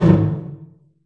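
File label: large room 909, reverb; TR 909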